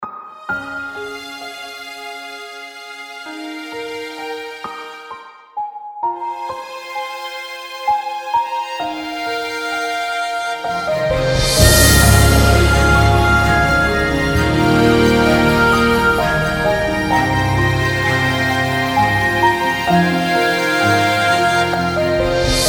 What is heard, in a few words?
fantasy logo music jingles; tad; bo jingles expansion